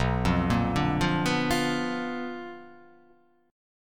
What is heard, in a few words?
B Minor Major 7th Flat 5th, chord